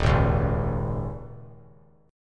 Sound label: Eb 7th Suspended 4th; Eb7sus4; strummed; JGuitar